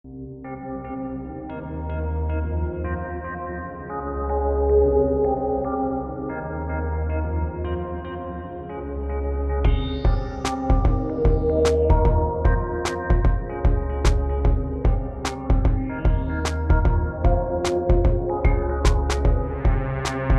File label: Organun; Ophesion